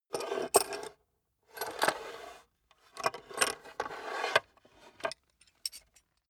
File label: small metal handling on wood; industrie wirtschaft; metall